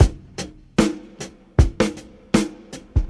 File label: 77 bpm drum loop g sharp key